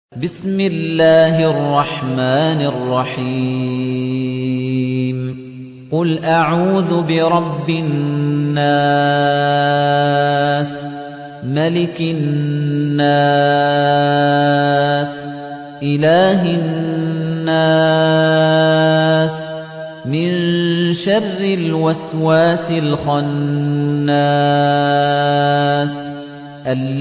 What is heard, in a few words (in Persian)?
ترتیل قرآن کریم سوره ناس